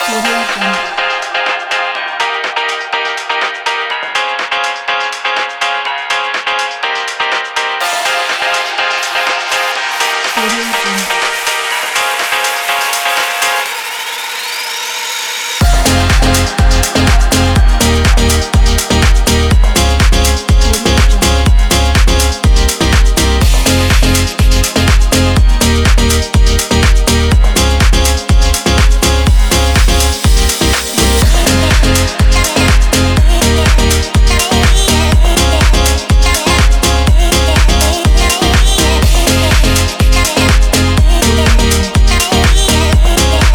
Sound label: on house